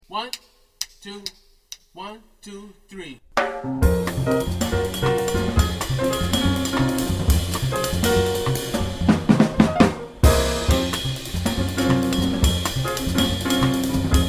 minus melody

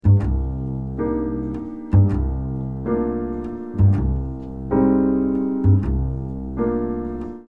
drums; piano and bass Intro rhythmic figure

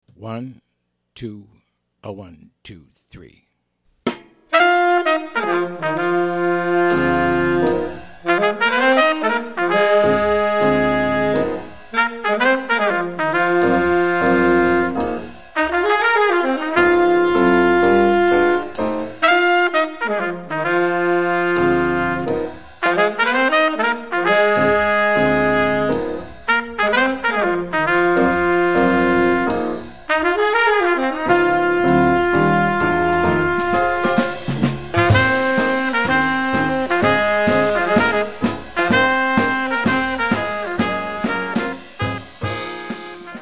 minus Bass

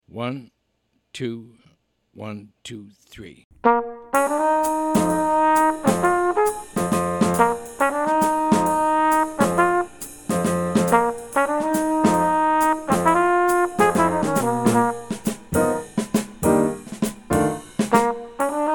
minus bass

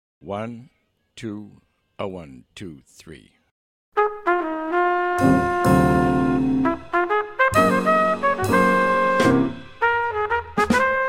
minus bass

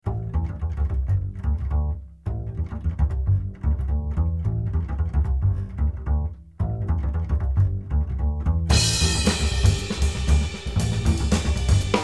minus Piano

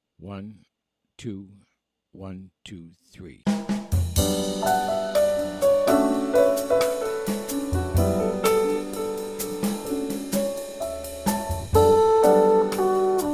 minus bass

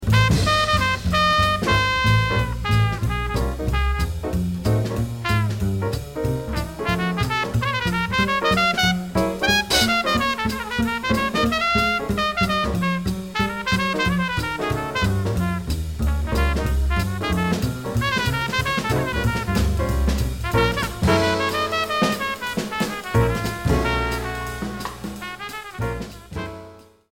Trumpet Solo, bop tunes; is full of bebop-style lines that wind around chromatically in enclosures and passing tones

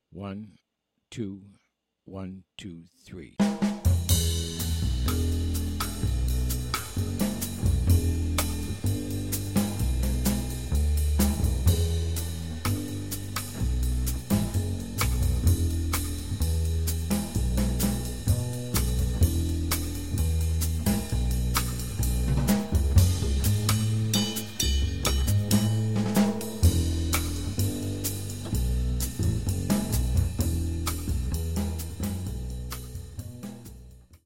bass drums only

